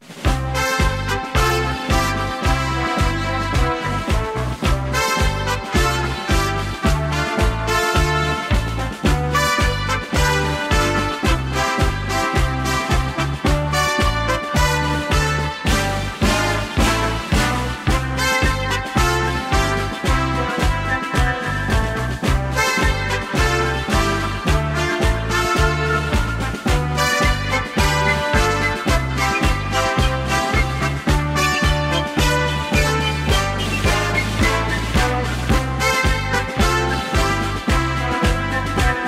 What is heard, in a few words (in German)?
Dinner Marsch; Traumschiff